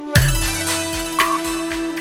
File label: Sms Tune